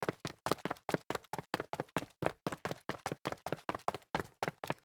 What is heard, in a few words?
sneaker stairs run concrete